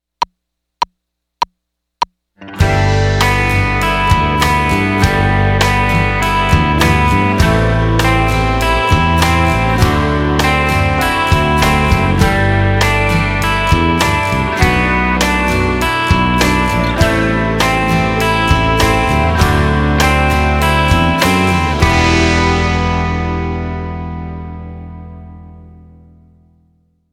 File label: Chord Tones